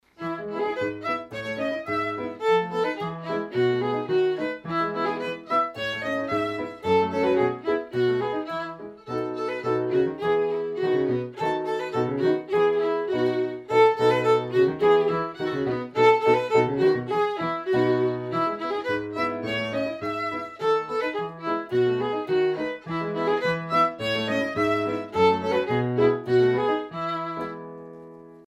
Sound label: Trepak